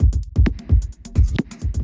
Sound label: electro kit 130